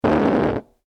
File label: Fart medium, A series of fart sounds; Human